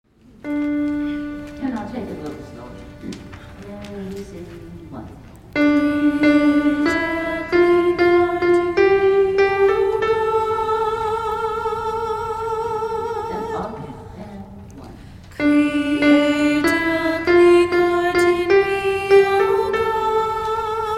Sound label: soprano